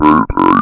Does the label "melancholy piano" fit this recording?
No